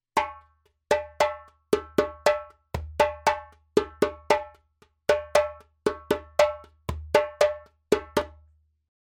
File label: djembe; djembebog oevelse